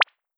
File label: cute pop